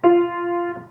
low F

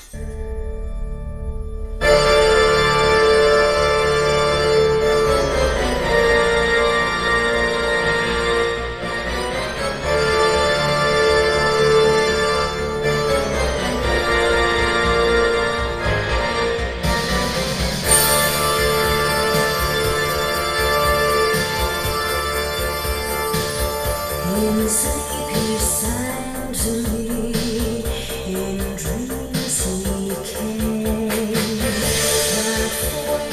Glass Convolution

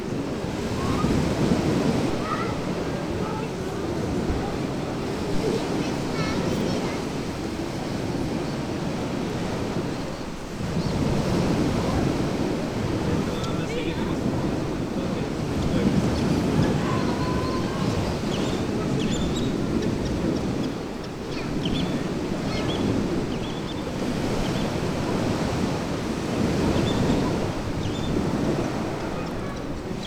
sea

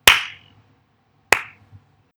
IR claps

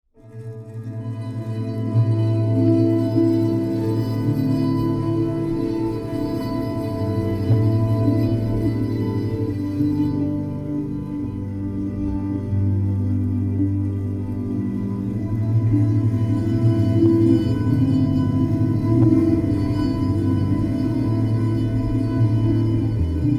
Playing the guitar with

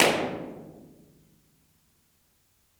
bridge IR

